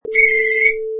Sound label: Referee Whistle